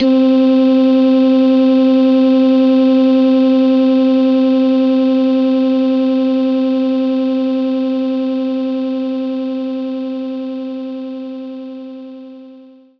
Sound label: voice oohs C4